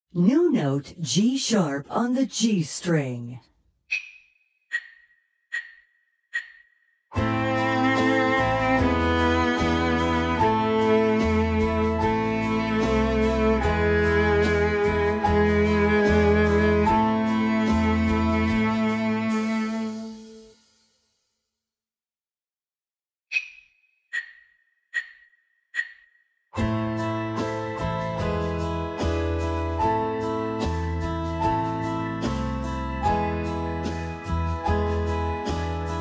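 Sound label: New Note G Sharp G String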